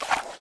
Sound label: pull arrow; Mount&Blade Warband